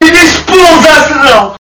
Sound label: fart; pvkii